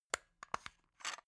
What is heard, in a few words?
plastic remote battery compartment open